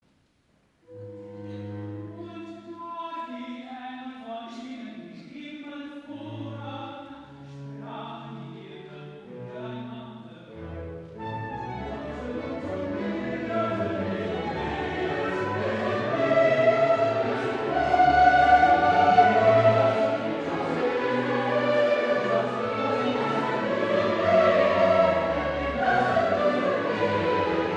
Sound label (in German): Evangelist Koor Recitatief; Koor Lasset uns nun gehen